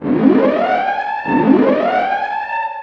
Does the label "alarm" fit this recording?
Yes